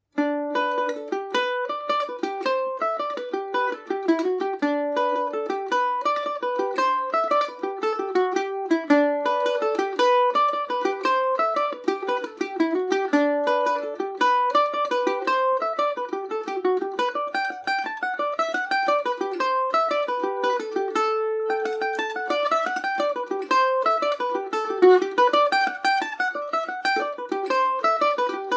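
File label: G major, Irish mandolin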